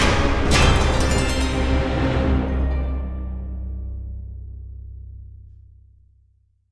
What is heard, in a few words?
bonus Lose; bloodsuckers2